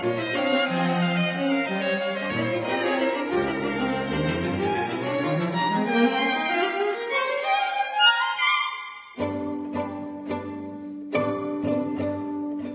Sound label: String Quartet Moderement anime; Milhaud; Op 87